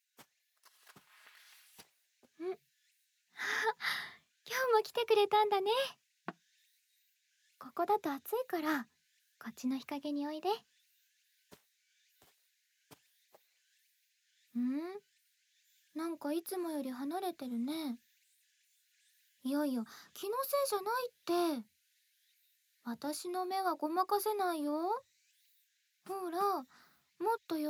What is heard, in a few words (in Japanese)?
縁側でまったり耳かきしてあげる。; SE有り